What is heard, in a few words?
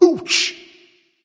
ouch, voice; smite; voicepack; ymir